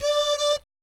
DOO DOOT, Vocal Planet Jazz

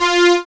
SYN MELOD, dance synth; Sound & Vision - Gigapack I CD 2 (Roland)

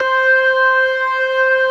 TARK, organ combos

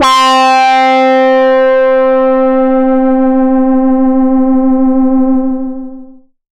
LED C4, SCI Prophet-5 synth keys

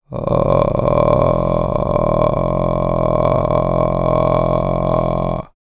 GROWL, VOCAL FX

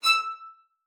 VIOLIN SPICC, E6; Xsample VOL-5

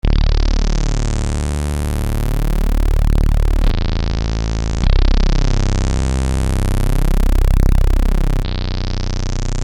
Syn Bass 100 Dm, Techno Synth Loops; Sonic Foundry (Sony Creative Software); Signals I

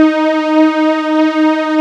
PAD WARM, Nord

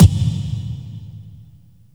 KICK PRO -R, Disco House; USB Soundscan vol.20 - Fresh Disco House I

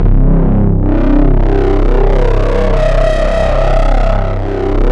SYN LO RE; AMG - Now CD-ROM (Roland); Pure Gold

